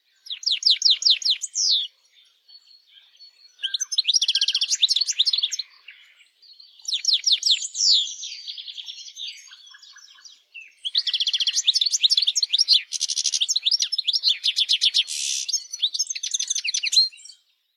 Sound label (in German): Stieglitz